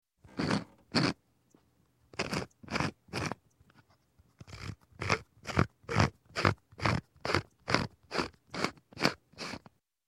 Pepper Grinder Grinding Pepper; Sound Ideas 2000 Series

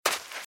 Footsteps - Sand - Dry - Run - Left; RadwinIsland

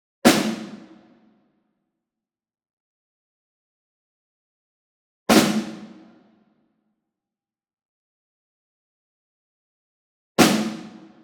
ENSEMBLE SNARE Group Hit Medium; boom library; Cinematic Strikes Construction Kit

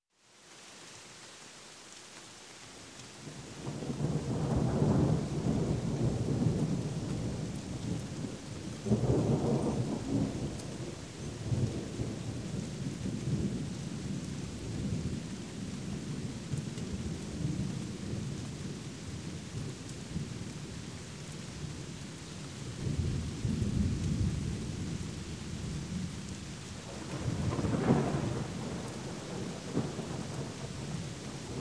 Rain, Medium, Constant Thunder; Sound Ideas 2000 Series

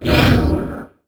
Pickup Scifi; SFX Kit

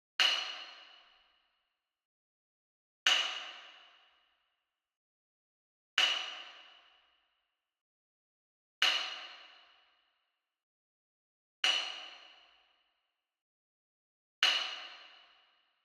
Wood Percussion Wood Block Traditional Hit Far; boom library; Cinematic Strikes Construction Kit

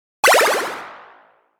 bad roll; Dice World

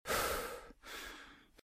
Breathe Breathless; Eden; RadwinIsland

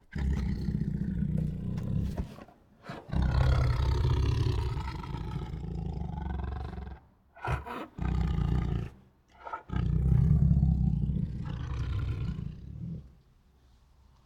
Two Tigers Make Many Threatening Growls; Sound Ideas

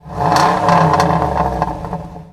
98 Transam Rev, Automobiles

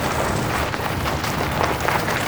Auto Tires Ice Loop; Automobiles

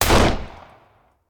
Hand Gun; Sound Ideas; Guns

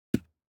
DICE on Felt, Drop, Standard, 1 One Die; Samples; ArticulatedSounds; DICE Sfx Party (Extended)

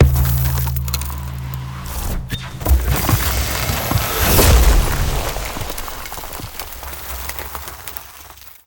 Ice Spell Damage AoE Hailstorm Barrage; Arctic Aura

